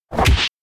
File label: kick; spica; anemoi